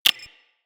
Console Hack Button Press

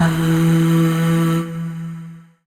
Void Shawzin Oct One E; Warframe; Zariman

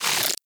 Pelt Shed Deactivate Particles End; Warframe; Dragon

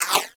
Laser Whizzby; Warframe; Bullet Impacts; Whizzbys

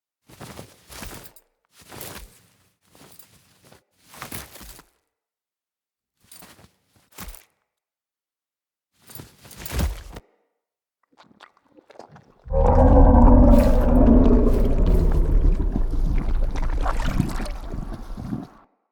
Wake To Eruption; Deora; Garren Eruption

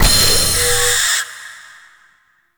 Item Found; Warframe; Duviri; Owl Recon

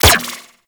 Laser Fire; Warframe; Corpus; Riot Moa

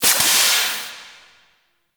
Injection Chamber Steam Blast; Ambience; Warframe; Grineer Ocean; Ocean Sabotage Injection

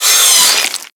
Miter Flesh Impact; Warframe; Tenno; Hit Effects

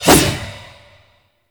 Void Tonfa Whoosh, melee weapons

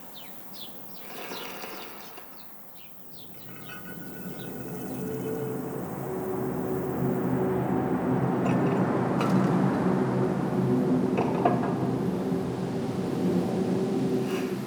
Summer Exterior Medium Bed Loop, Ambience; Warframe; Nineteen Ninety Nine

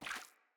Rat Guide Water Step, Foley

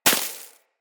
Bullet Impact Debris Snow; Weapons

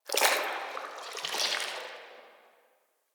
Root Cave Sap Gush; Ambience; Neathuns Root Cave

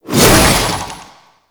Shield And Sword Charge Throw; Warframe; Grineer; Mortifor

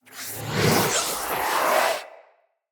Staff Caster Charge Heavy; Weapons; Player; Staves; Wazzard Staff Caster

